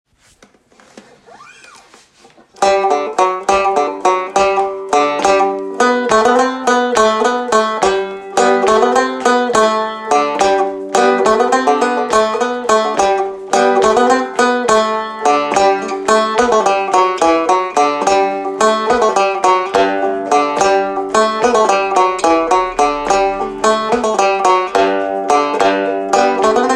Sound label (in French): Bourree 3T; 5 Noisettes